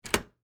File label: Door Unlock Hotel, Foley, Door, Unlock, Hotel, Room